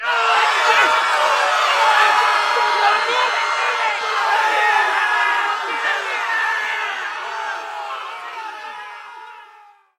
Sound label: panic